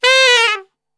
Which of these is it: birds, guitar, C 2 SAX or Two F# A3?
C 2 SAX